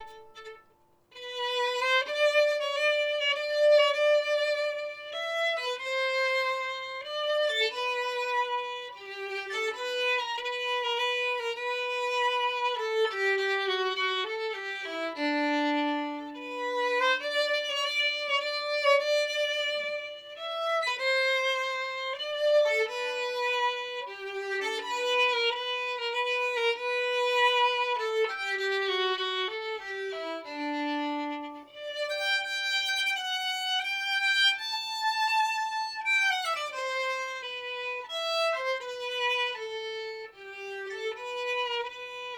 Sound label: menuet; beethoben